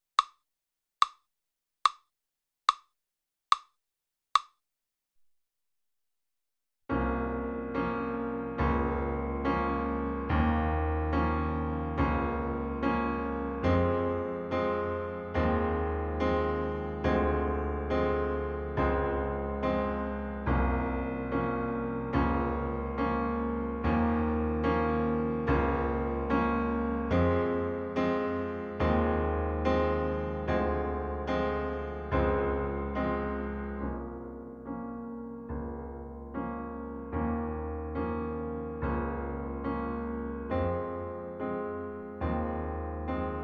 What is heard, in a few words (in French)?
rouge accompagnement